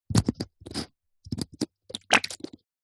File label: food drink bottle plastic sport squeeze water dribble nozzle